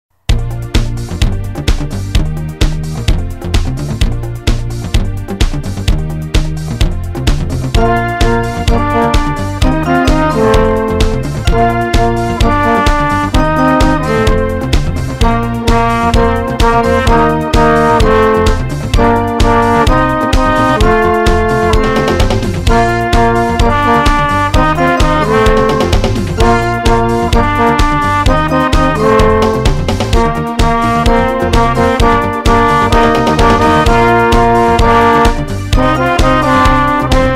March

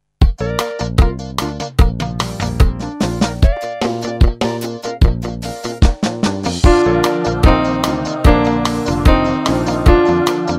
Merrily (duet)

